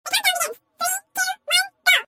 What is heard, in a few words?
countdown